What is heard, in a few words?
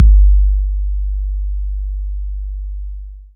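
R8 BD, R8 bass drum; E-Lab; X Static Goldmine 1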